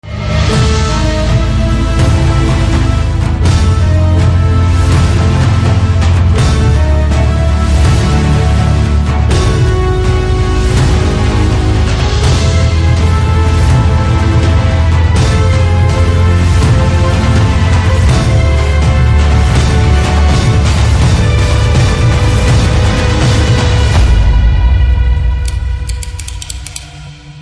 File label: Trailer BGM Ringtone; Vishwak Sen; HIT